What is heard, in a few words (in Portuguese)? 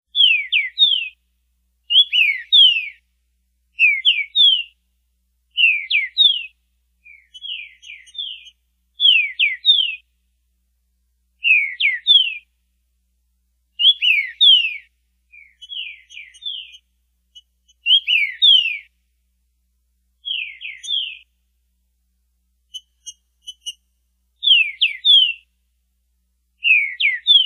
Canto Trinca Ferro Repicador